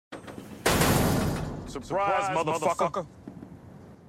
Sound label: surprise